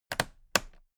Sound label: toolbox latches close heavy double; industrie wirtschaft; handwerk